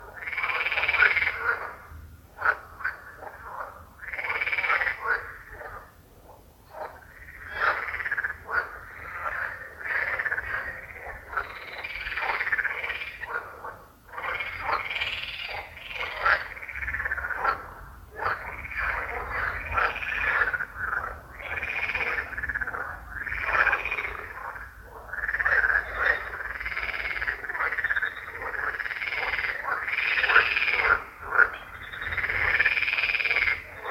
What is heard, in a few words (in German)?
frosch